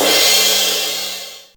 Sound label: DRUMTRAKS, classic machines